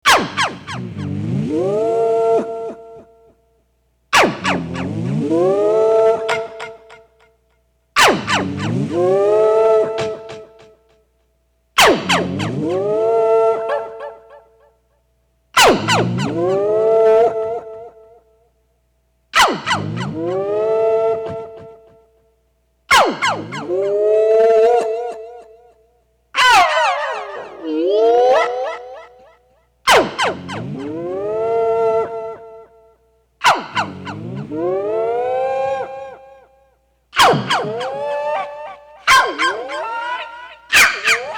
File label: Emergency Siren